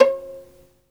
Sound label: SOLO VI, Synclavier Strings; ILIO